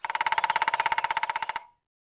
woodpecker; Pirates Of The Caribbean

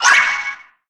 FIELD TURN; Puyo Pop Fever

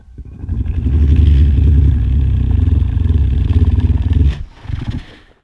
Growl, Dino

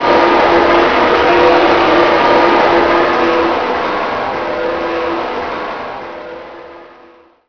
boo crowd; XBOX; MX 2002 Featuring Ricky Carmichael

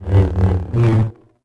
lightsaber attack; weapons

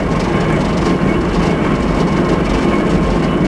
ferris wheel loop; Tony Hawk's Project 8; XBOX

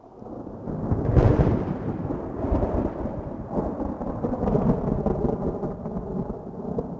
ROLL; XBOX; Crash Bandicoot The Wrath Of Cortex

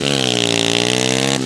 power, Engine

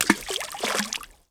ball splash big; golf; ProStroke Golf World Tour 2007

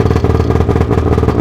idle, sled; WhiteOut

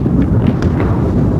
shops bowling ball; Tony Hawk's Project 8; XBOX

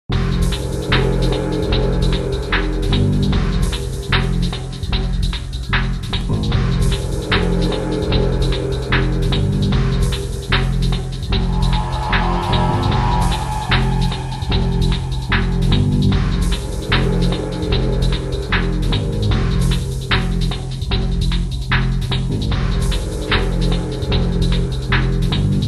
pause, music; WhiteOut; XBOX

